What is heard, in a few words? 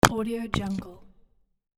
wood drop